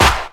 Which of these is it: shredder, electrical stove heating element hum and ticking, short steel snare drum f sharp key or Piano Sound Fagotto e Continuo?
short steel snare drum f sharp key